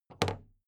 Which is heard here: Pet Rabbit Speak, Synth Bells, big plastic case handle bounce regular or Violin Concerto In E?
big plastic case handle bounce regular